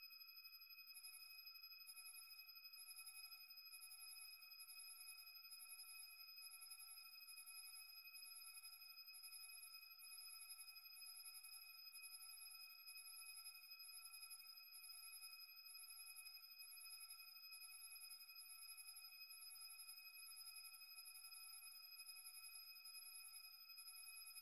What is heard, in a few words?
Sweeter Tone